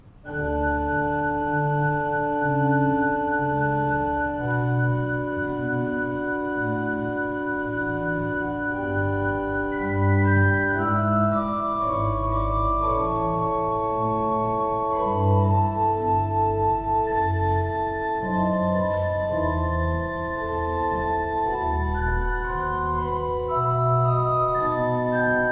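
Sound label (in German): Kemper Mayer, pfeifenorgeln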